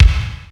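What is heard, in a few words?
ROOM BD, bass drum; R50III; Crypton - DrumBase5000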